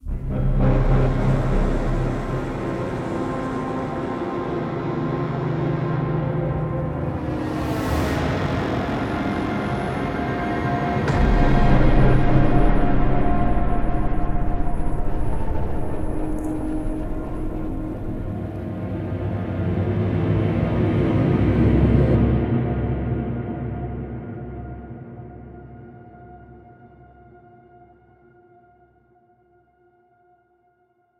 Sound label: Tension A Stem; Megaton Rainfall; PS4